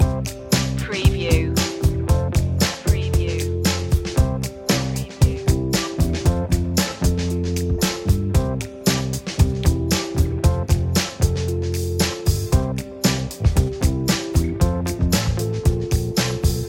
relaxing groove loop

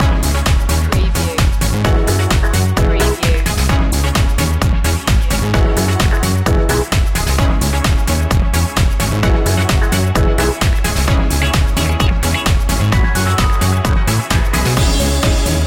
pump steady groove loop

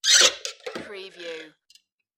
Domestic Door Squeak

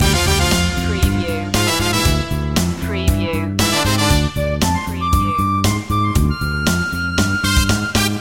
spy lounge loop

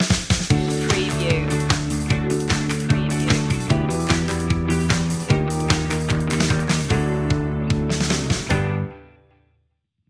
inspiring stinger

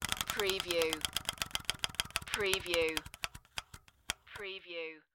Paper Hitting Wheel Spokes; Wheel of Fortune

